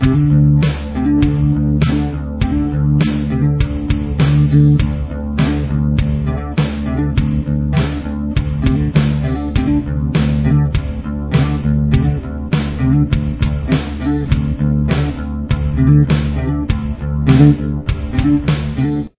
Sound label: bass; Verse